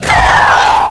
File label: death